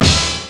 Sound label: HIT, Hip Hop; E-MU Formula 4000 Series Vol. 1; Hip Hop Nation